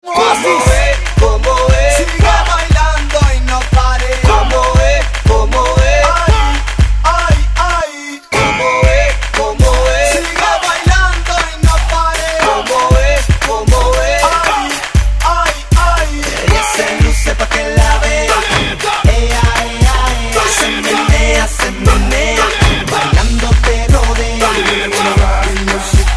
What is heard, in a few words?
Ringtone